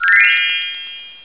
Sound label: Tag Continuous Data; gps phototrackr